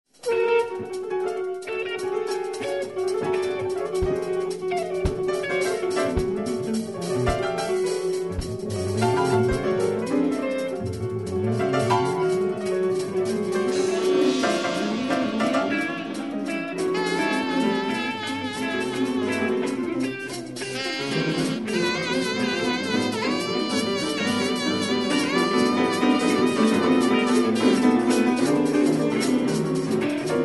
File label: free sound crossover improv; improvisions